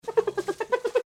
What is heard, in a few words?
giggle call hyenas; kadogo